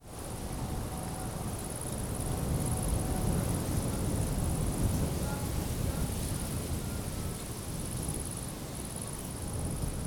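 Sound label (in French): Vent, Paysage sonore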